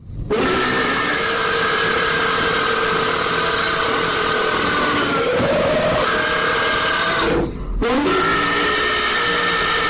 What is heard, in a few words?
loud burp